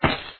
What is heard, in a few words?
bag land